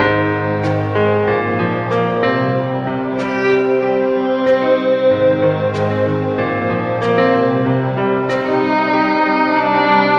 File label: piano and strings, Sad